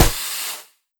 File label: Nature Hit; Legend of Keepers